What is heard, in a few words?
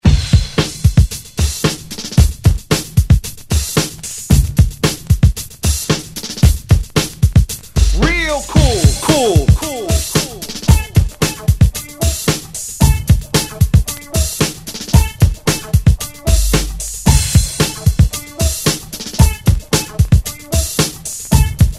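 hip hop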